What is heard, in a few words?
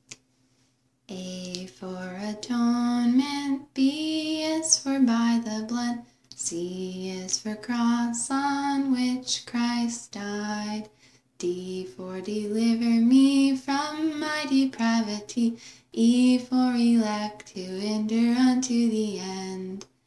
childrens alphabet song